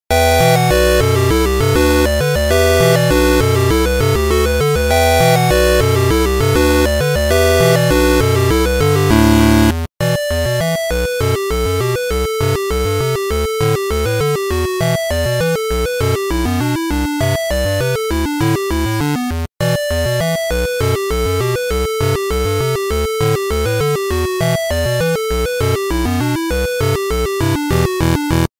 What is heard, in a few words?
8 Bit